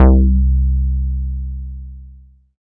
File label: BASS MONO